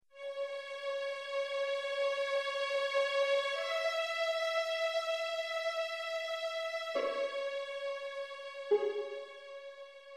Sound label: Rain Orchestral